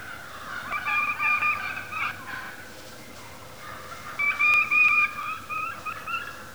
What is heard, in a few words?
kee kee, Turkey Sounds